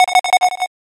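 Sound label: Bleep delay; Sony Ericsson; PlusFourSix; Brittany (W508)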